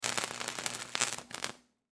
Arch Welder Spark, Arch Welder Crackle Short Electricity Spark; Industry